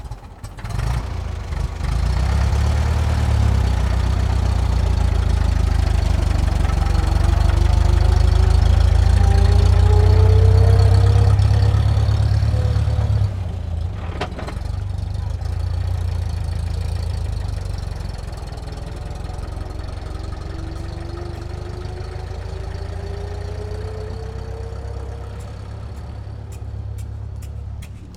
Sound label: truck chevrolet 1926 30cwt start drive off